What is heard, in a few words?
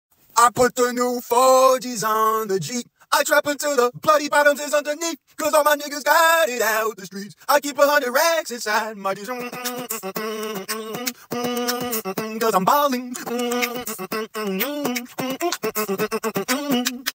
beatbox sound; Ballin